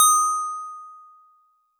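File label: Metal Tube DX100 D#7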